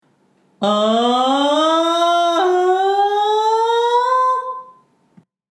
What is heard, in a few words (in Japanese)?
地声 裏声 分離